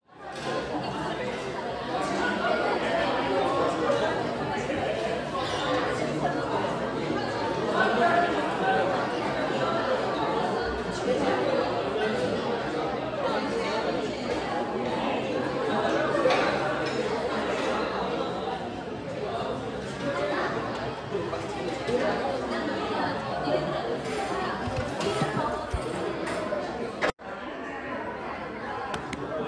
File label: Cafe Ambience